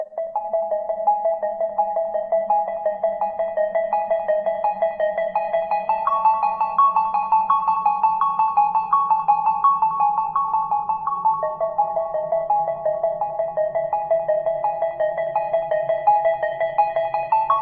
Cave Rift Relaxed; Deadbird

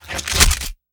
shotgun equip

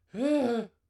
Startle; White Servant

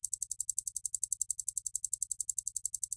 map zoom out

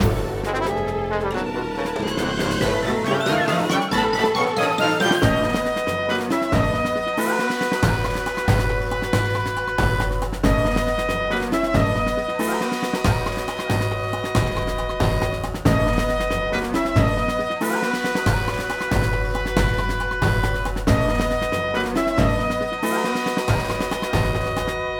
Small Victory; Pikmin 3 Deluxe; Golden Arachnorb

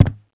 Wood

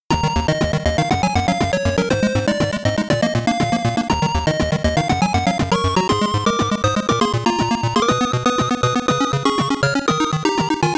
POWER UP (PSG); New City Hero

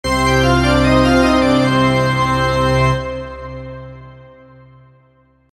Epic Fail; ufs league switch gamerip 2022

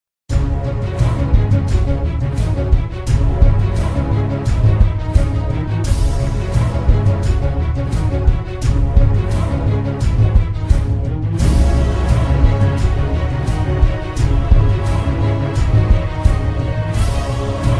Medium Tempo Music; entwined 2 the perfect murder

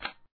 Minigame No ammo; papas cupcakeria online windows gamerip 2013

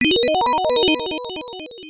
Logo; Sega Logo; Chou Kyuukai Miracle Nine; genesis

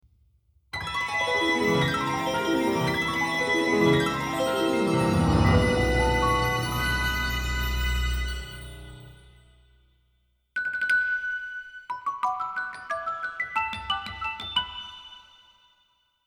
Wind Marimba; the legend of zelda links awakening orchestral arrangement 2015; 5th Instrument of the Sirens

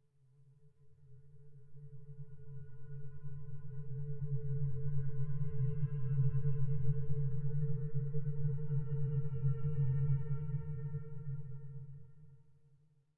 music - calm - future ambient random reverse; You Are Empty

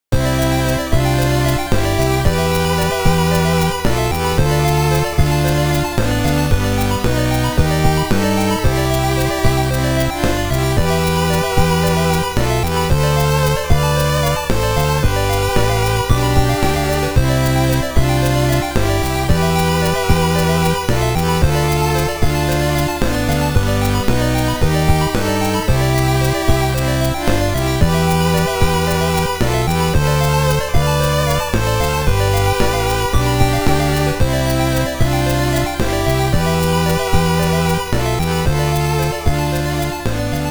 Stage Clear Theme (Stage Clear BGM); Esper Dream 1 2 Sound Memorial

